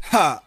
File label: huh male, Voice